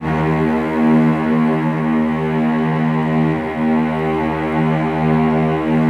D#1, Celli; Best Service ProSamples vol.51; Classic Orchestra 2

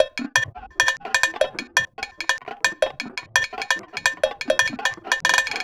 Percussion, percussion loops; KJ Sawka - Drum n Bass & Beyond; Drum Tool Box

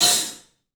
club hat; 3D Sound; 3D Drums

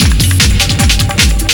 SPEED, Big Beat Drumloops

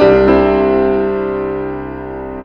PIA, PIANOS; USB Soundscan vol.02; AKAI

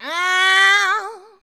"aauu", shouts; Best Service; Hyper Dance

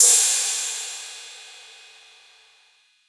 Crash Synthetic Bass House; RESISTINSIST

